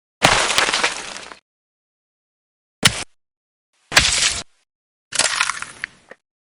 snowball hits var; Nature

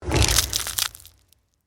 ice magic bolt; SFX; Ice Magic Bolt 2; DailySounds

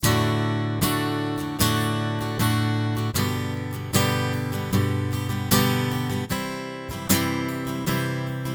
acoustic strums; Music

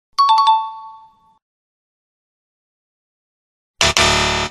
computer beeps; Sci-Fi

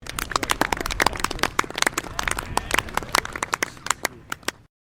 lackluster applause; SFX; DailySounds

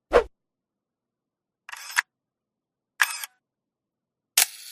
sneaking steps; Foley

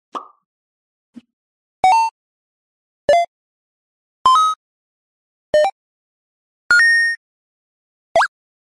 video game intro; Music

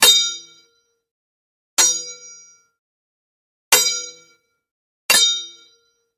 sword impact variations

